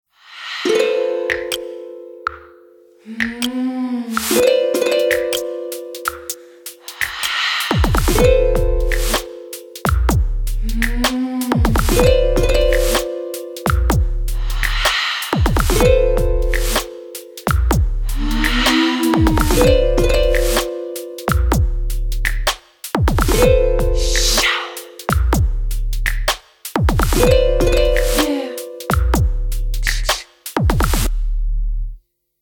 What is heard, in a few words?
Breathing; Nokia; Lumia 925